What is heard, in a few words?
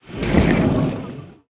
cyclone; smite; poseidon